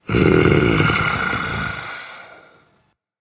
sigh dragon; fafnir

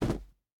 land; smite; merlin

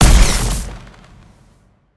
ground slam; smite; npc; grkconqs8 titan chaos; s8chaostitan

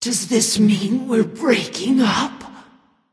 death; smite; voicepack; serqet

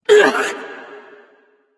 grunt hurt; smite; thanatos

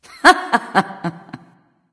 "heh heh"; smite; nike

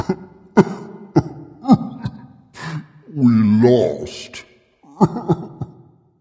boohoo dragon; fafnir

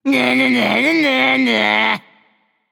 nv tongue; smite; voicepack; hachiman

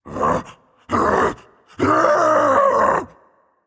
fear, voicepack; smite; jorm

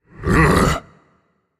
"ugh"; smite; voicepack; set

